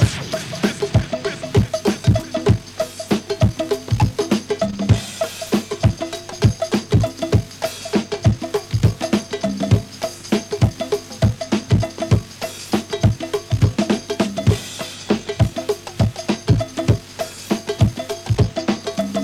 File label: gogo rhythm loop